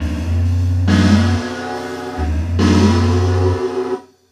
drums membrane; Dynamic DWM

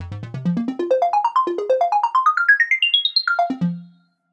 synth; Diffusion; Processed

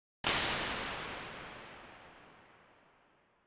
Choir to Nave B Format

